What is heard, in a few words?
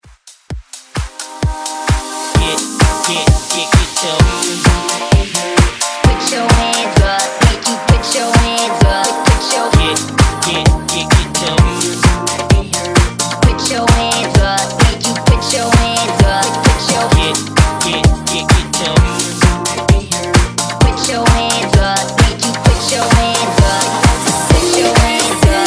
Pop Redrum, 128; 90s